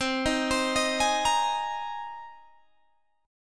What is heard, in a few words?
C Minor 7th Sharp 5th C down Guitar Open G